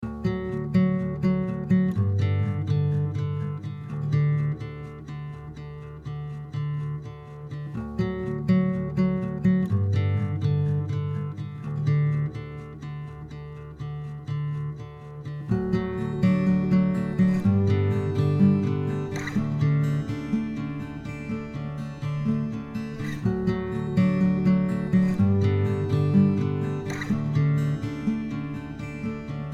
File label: Background; Valley Runner